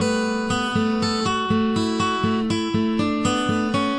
acoustic guitar picking; GUITAR LOOPS

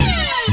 string slip, Guitars

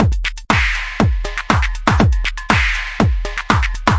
120 bpm, electroecho, drum loops and breaks

